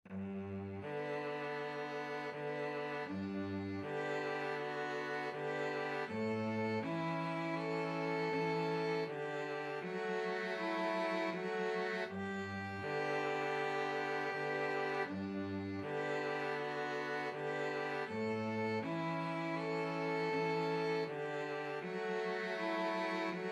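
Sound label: strings; 2 violins cello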